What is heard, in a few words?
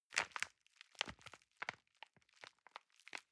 envelope handle soft